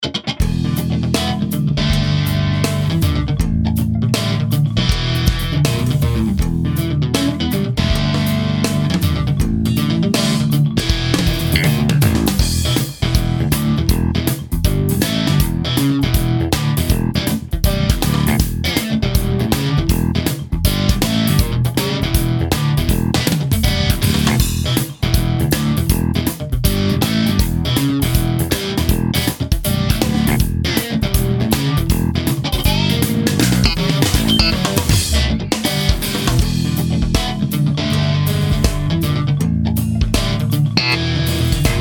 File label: Funky Blues